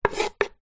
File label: household knife scrape vegetable cuttings on wooden chopping board